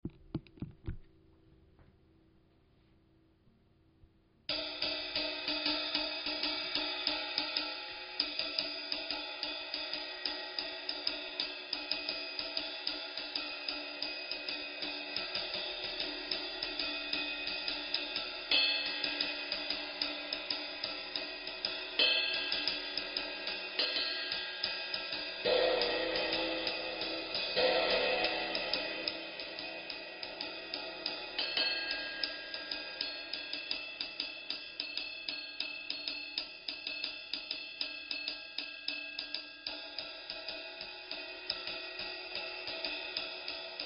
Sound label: Meinl Byzance 20 Dark Ride